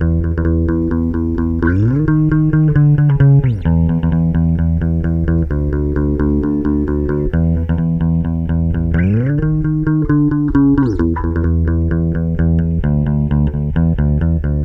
FEVER 131, Bass Grooves; USB Soundscan vol.30; AKAI

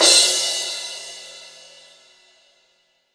MIX CRASH, Crash Cymbal; MONO CRASH; AKAI S6000 CD-ROM - Volume 3

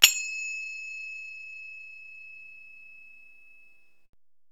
FING CYMBAL, FINGA CYMBALS; Zero-G Ambient 1

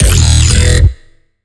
bass growl

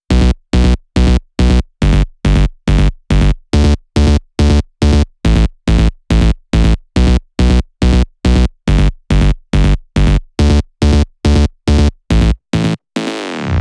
140BPM B; Vengeance - Essential Clubsounds Vol.4

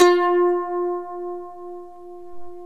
MANDOLIN

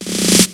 Fill, Snare Fills

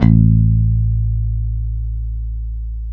A 2, go-go mute, bass; Spectrasonics; Bass Legends CD 1

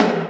Korg N5 Rock Kit C#3

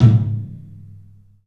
TOM, 3D Drums, CLUB TOM; 3D Sound; CLUB 12 TOM

